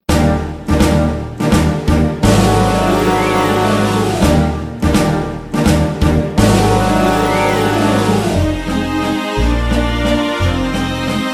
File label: (Eb) No Backing Vocals